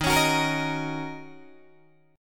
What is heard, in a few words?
D sharp Minor 7th, Guitar, DADGAD